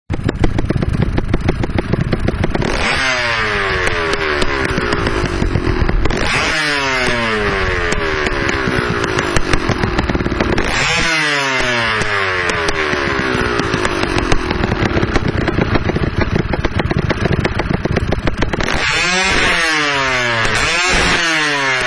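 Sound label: exhaust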